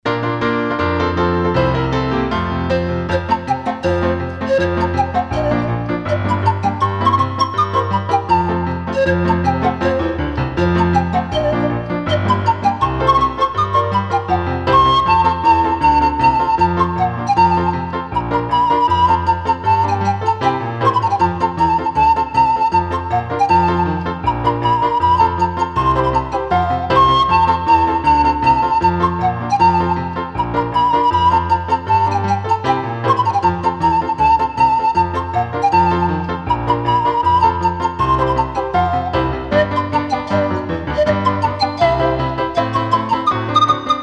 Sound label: Jingle Bells, instrumentala